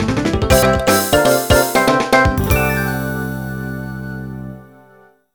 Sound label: Jingle Big Success; Virtual Villagers A New Home; 2009 nds gamerip